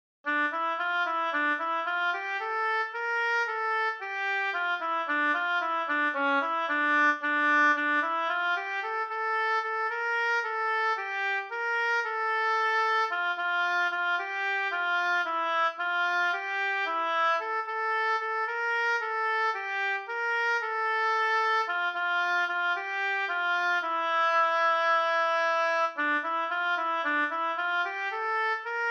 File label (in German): Czardas Oboe